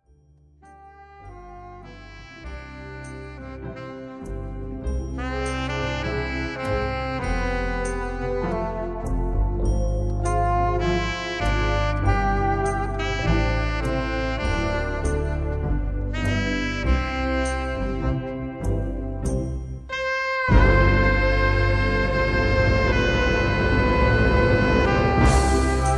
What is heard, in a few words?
sax; wedding